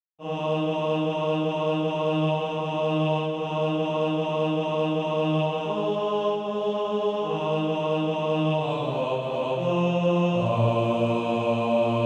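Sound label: bass; A Message of Hope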